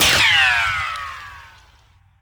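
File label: ricochet; gmod; viper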